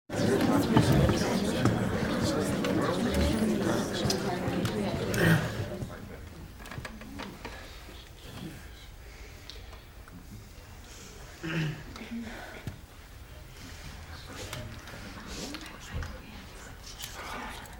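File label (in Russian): в зале суда с атмосферой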